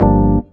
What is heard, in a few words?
stabs hits